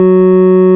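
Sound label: organ F#3, Farfisa Combo; Keyboards of The 60's and 70's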